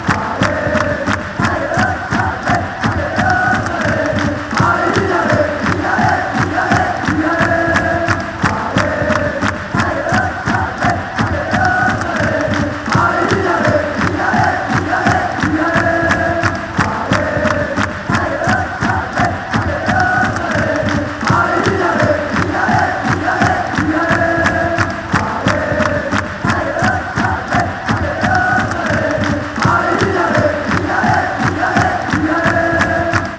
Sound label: al oh oh ay, fanchants